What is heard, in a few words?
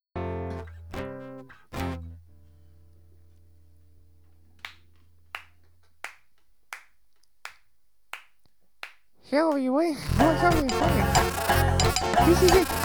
ping mr kazoo